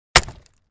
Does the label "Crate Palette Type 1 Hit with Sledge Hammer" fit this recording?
Yes